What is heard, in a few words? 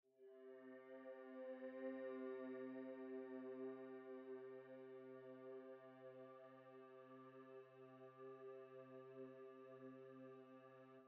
base, Music